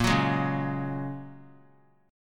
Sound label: A sharp Minor 7th Sharp 5th A sharp, 3C,3F,3A,3D; strummed